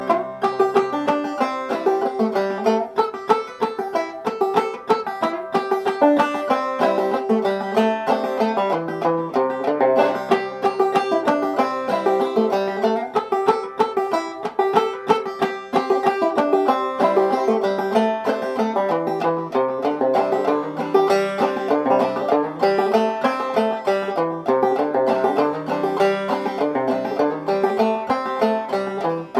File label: Banjo Fast